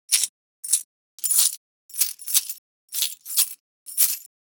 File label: coins hand jingle movement